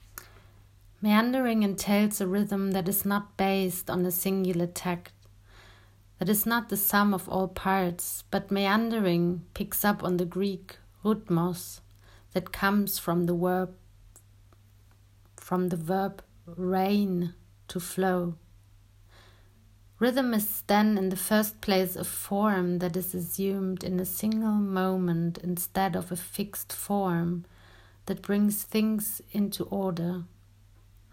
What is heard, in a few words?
breathing writing